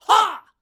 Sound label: vocals shouts "yah" double punchy; TKO